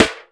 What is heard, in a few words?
low acoustic snare sound c sharp key; snare drum samples